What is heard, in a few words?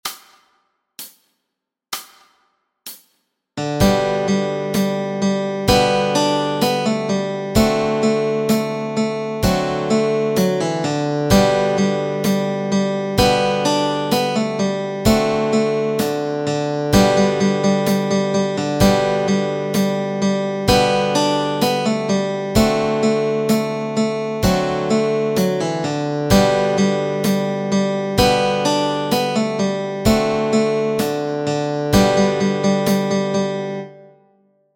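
Melody and Chords Track, Easy Guitar and Ukulele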